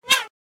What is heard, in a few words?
Whip sound; Olivier Girardot; Hand Guns Sound Effects Pack